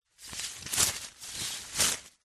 magic plant vines leaves movement wrap around grab reversed